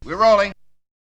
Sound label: Vocal; ZERO G - Datafile DISC 1